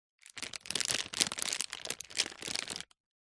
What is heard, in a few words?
foley packet baby wipes handling rustle; zapsplat